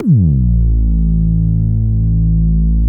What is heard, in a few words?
OB VOX A2; Hollywood Edge - Giorgio Moroder Rare Synthesizer Collection; OB 4 VOX.